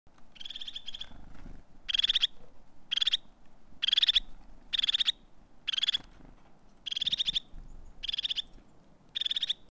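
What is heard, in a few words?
crested tit voice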